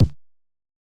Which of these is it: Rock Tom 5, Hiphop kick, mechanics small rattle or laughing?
Hiphop kick